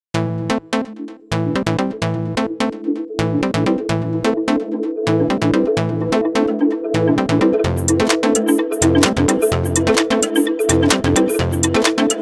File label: Electric Marimba, Nokia Tones; Windows Phone apps